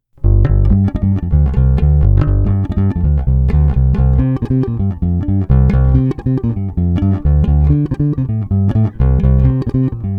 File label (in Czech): Bonusová ukázka FW hraná ve stejném pořadí poloh jako výše, Baskytara; Lakland 44-64